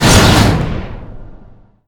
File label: whoosh; gundyr